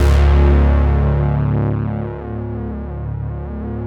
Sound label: chainsaw mass, keyboard pads